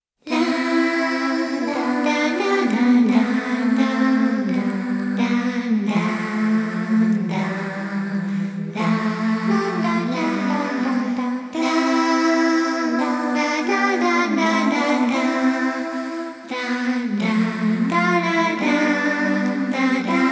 Short instrumental; Otome Soumu Yukari Koshiki

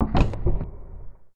Un Pause

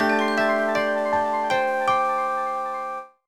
Jingle Workshop Unlocked

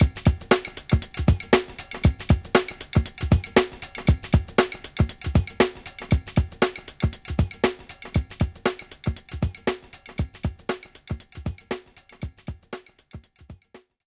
Loading Loop; de Blob; Wii

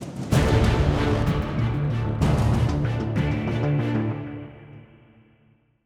190bpm; Legends of Pegasus; windows gamerip 2012

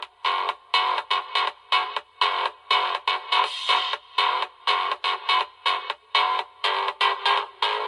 Edm Headphones; katana zero

